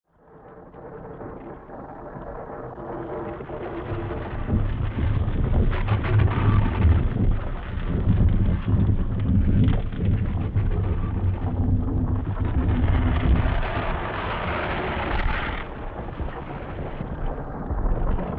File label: Ice Cracking Rubbing